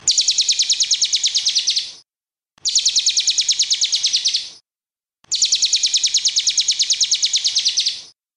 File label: lovebird